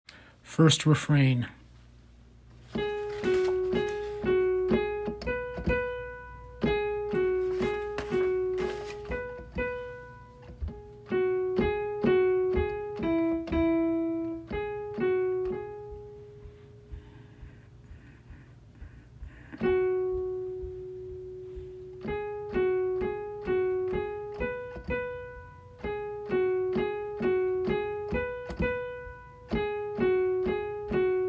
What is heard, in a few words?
soprano piano